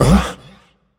surprised; smite; hades

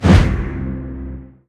small swing; sobek; smite

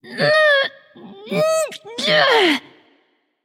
grunt lift light; smite; voicepack; hel

